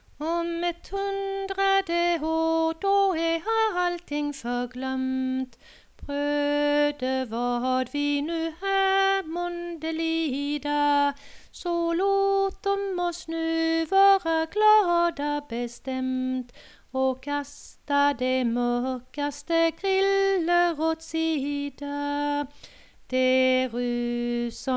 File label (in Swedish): Om ett hundrade ar melodi